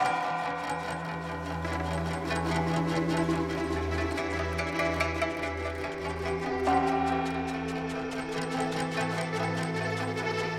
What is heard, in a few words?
Music Tension; Sifu; H2 New Level Area=PostScoubi